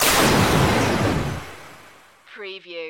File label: Magical Laser Shimmer Shoot